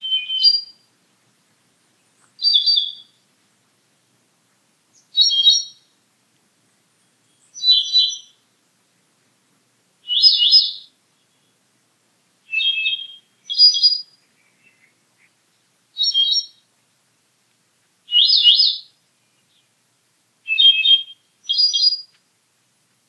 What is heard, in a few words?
Myadestes ralloides